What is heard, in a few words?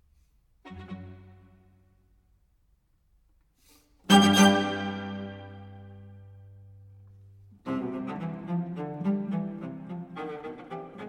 String Quartet No. 1 III. Vivace